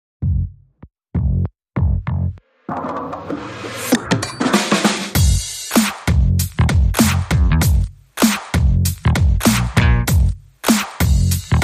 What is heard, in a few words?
Drums and Bass